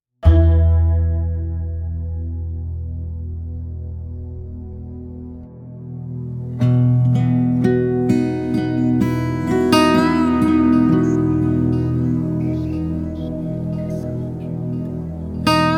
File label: meditation